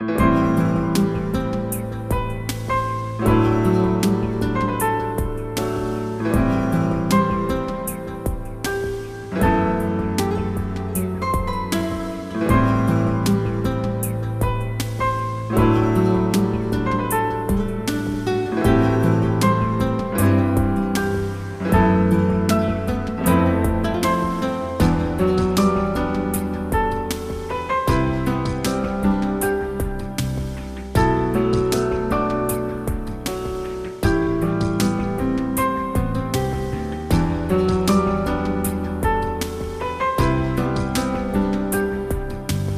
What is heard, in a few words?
BGM SERIOUS; maid paradise mezase maid number one; psp